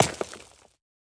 滴水石锥落地声

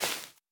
海绵踩踏声

